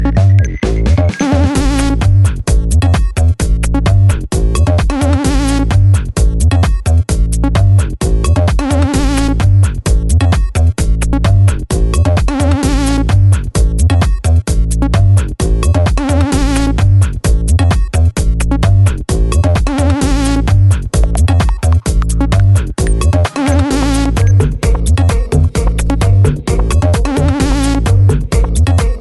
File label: Crazy Minimal